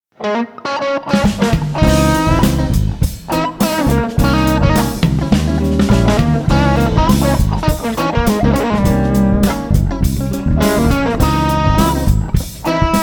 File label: lydian b3